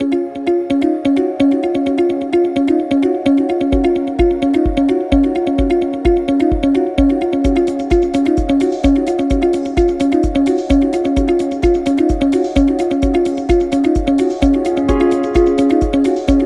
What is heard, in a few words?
Promotional Motivational Video Presentation, corporate music; corporate music vol 8